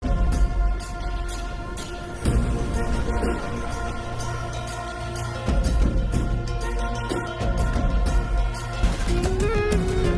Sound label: glimpse bgm ringtone; devara bhaira